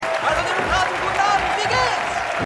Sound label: Hamilton Swing; The Good Fight